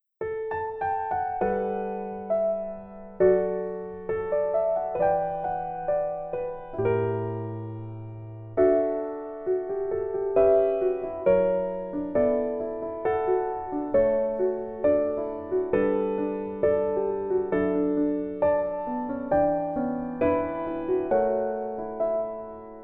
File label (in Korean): Piano ver, 멜로디MR